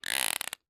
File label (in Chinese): 海豚待机声